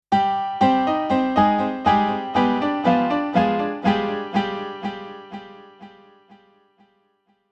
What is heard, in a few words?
Piano Delay